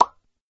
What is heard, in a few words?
boom